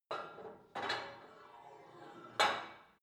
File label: put weight onto barbell, fitnessstudio